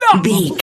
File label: voice male hurt pain